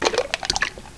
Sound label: BEERSOUND